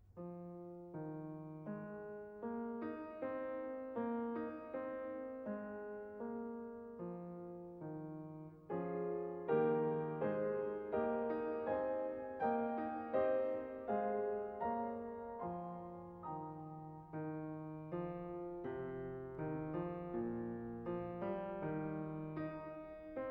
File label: Concerto for Piano and Orchestra